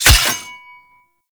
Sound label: Melee Axe Impact Robotic Flesh; Weapons; Impacts; Axes